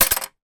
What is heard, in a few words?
office plastic childs scissors put down on table